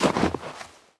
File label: snow step; Golden Crayon Sounds